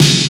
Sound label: snare cheap; Roland L-CDX-01; Snares 5; Sn Modules 5